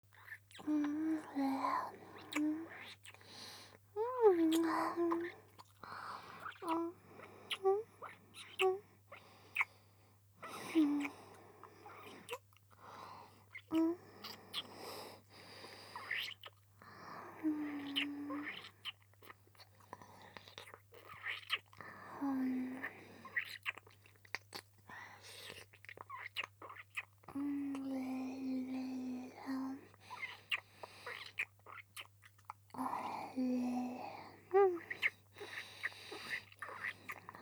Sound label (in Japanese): キス 普通